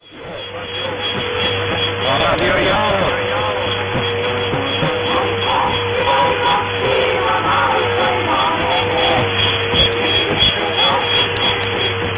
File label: 1630 kHz; Javor